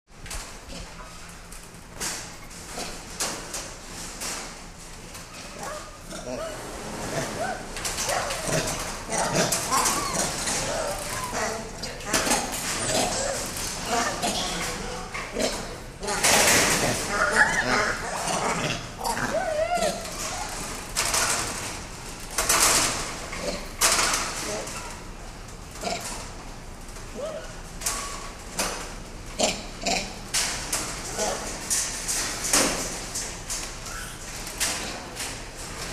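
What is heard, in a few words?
chimpanzee in a cage sound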